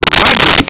rhodes

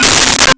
Marimba C4; Nintendo; July 2020 Gigaleak

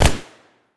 smg, fire; weapons

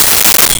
Triangle; Nintendo; July 2020 Gigaleak

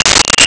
Claves; Nintendo; July 2020 Gigaleak; DrMarioSound

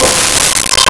Kalimba C5; Nintendo; July 2020 Gigaleak

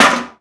crash

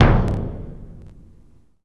BD, c4; Nintendo; July 2020 Gigaleak

